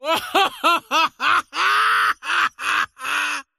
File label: wheel laugh; dota; largo